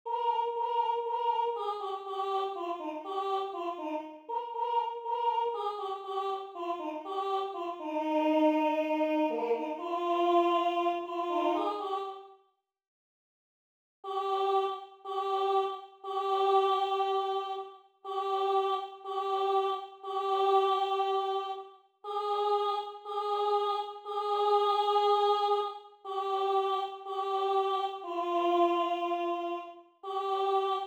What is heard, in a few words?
Soprano, SATB